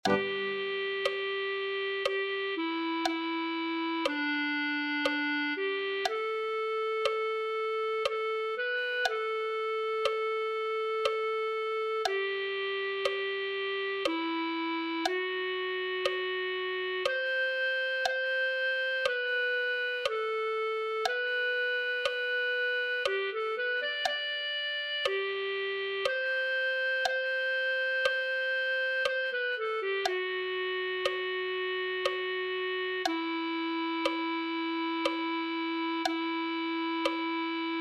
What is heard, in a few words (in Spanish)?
clarinete